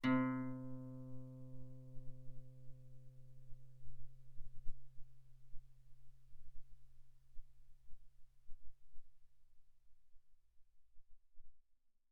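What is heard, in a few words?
Guitar mezzo forte sul A C3 B3; mono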